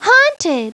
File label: haunted; Treasure Galaxy (1994); Learning Company